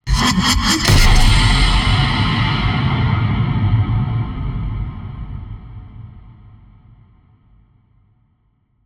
Death Pacts; SoundMorph; Bloody Nightmare; GDC Game Audio Bundle

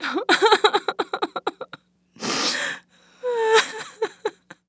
Human Female Cry Light Cry

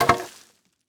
Impact Wood Drop On Dirt; Impacts

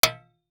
Impact Street Sign Metal Hit By Small Metal Pole

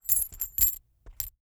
Coin Remove Coins From Pocket Short; Foley

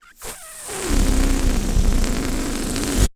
Cartoon Balloon Release Air

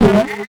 Multimedia Internet CD-Rom Flash Blurp

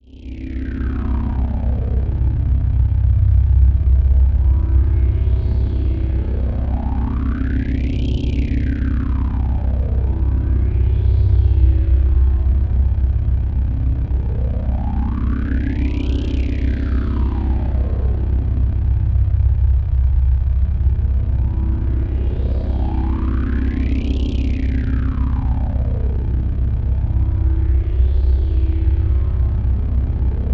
Drone Flange Square Wave Vortex; Production Element Imaging; AdobeCC

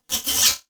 Multimedia Internet CD-Rom Flash Wipe Short

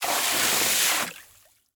Liquid Water Spray Burst Of Water

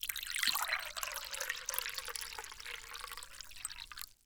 Liquid Water Water Pour Into Martini Glass

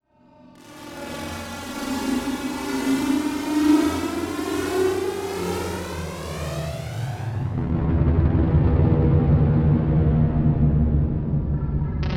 Empty Electronic Whoosh; Module Production Element Title Imaging

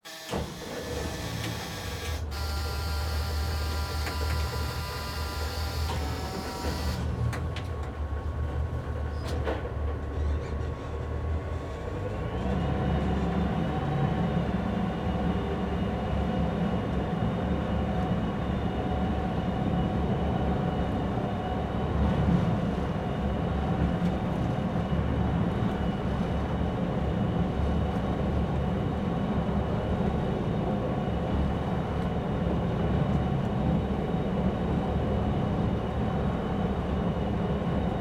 Emergency Patrol Boat Drive In Reverse; emergency effects; AdobeCC

